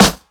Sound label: crispy snare single hit F sharp key, Royality free acoustic snare tuned to the F# note